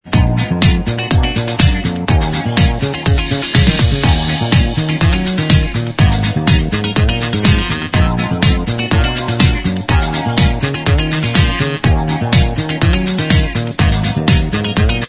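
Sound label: instrumental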